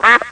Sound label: Quack